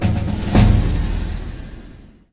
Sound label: drum; Fallout Drum